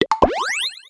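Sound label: totem crit up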